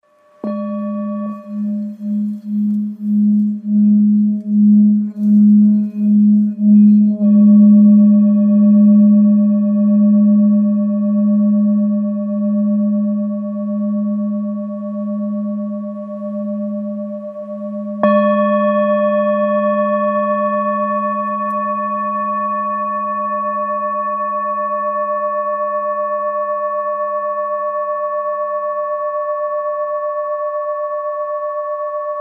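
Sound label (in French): Bol bengale sol dièse; 1-1 1kg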